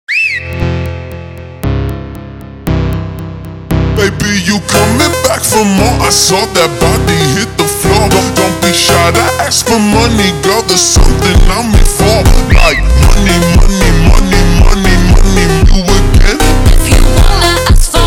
Montagem slowed

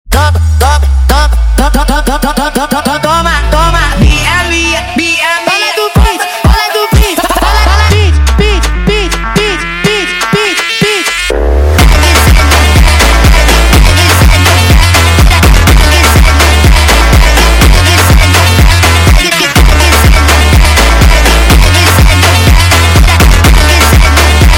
Montagem sped Up

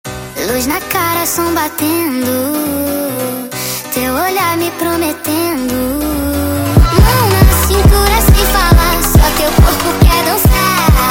Montagem sped Up

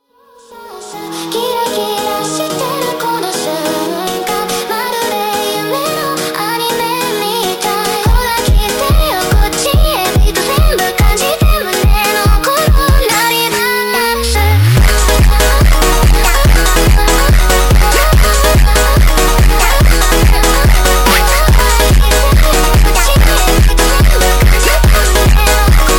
Montagem sped Up

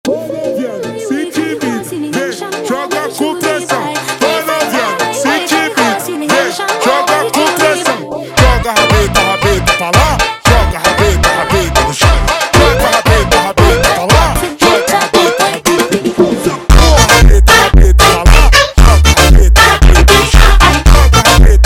Echoing Funk slowed

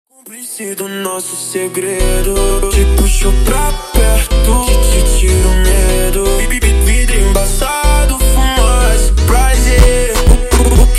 Montagem slowed

